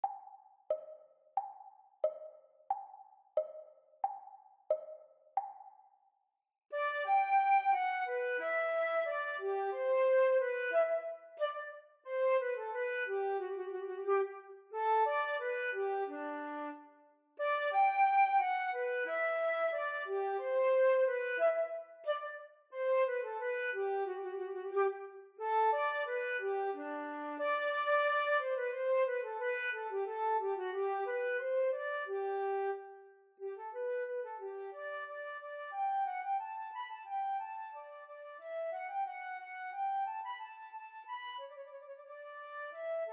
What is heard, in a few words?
Flute Canon Practise Speed; Grade 5; Telemann